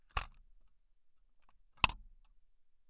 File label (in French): OUVERTURE VISEUR POITRINE MAMIYA RZ67